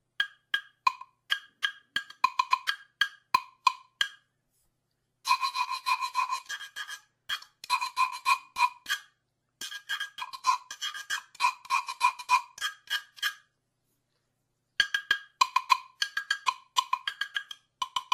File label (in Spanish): Guiro Doble